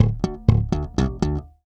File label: Slap Octaves, Bass Drum - Kicks